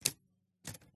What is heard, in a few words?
typewriter single strike; PMSFX